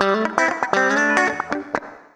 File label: Acoustic Guitar Loops, 120